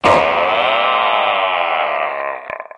madhouse; human

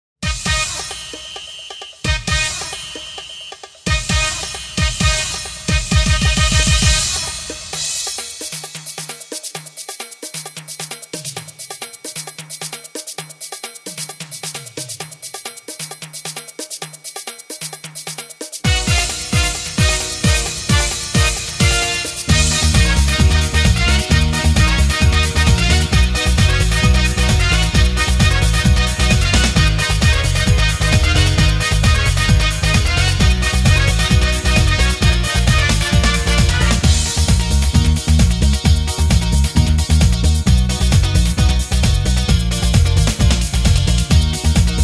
minus lofi

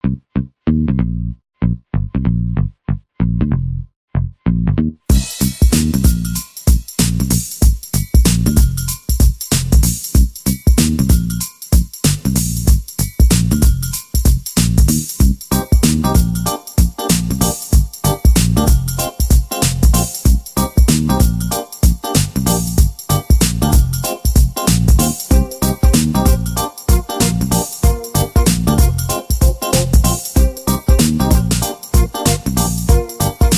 ringtone pop shuffle, instrumental

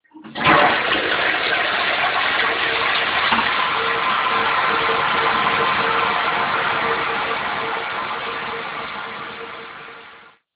ringtone wc flush

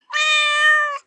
cat

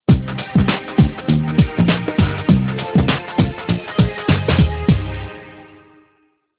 ringtone pop shuffle, instrumental

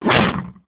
car door close; auto

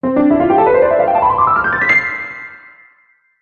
piano; instrument-solo

